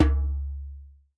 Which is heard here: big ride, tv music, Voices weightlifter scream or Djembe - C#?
Djembe - C#